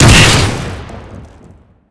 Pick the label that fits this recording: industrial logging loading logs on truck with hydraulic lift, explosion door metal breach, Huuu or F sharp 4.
explosion door metal breach